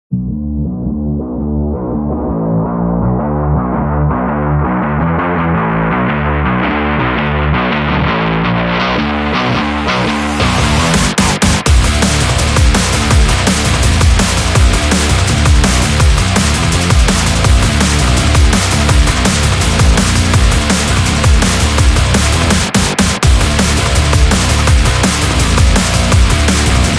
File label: Energetic